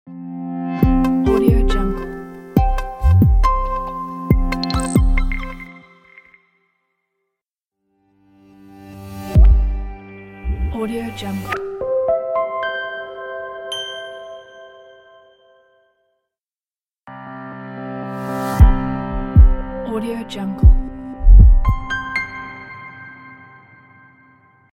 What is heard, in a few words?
simple logo pack; soundvessel